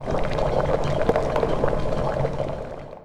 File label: cauldron boil